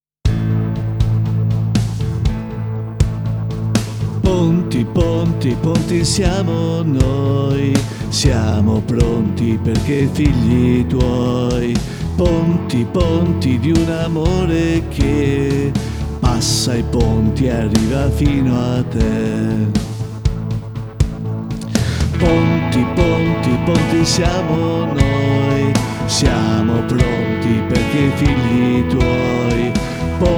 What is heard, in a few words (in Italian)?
INNO CARITA CANTATO; INNO CARITA 2017